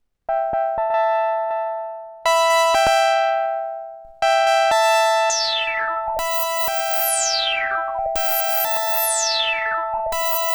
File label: JUNO-106